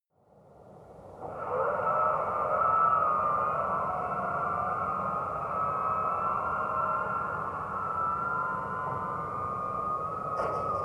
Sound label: atmosphere screaming water, Horror; atmosphaeren umwelt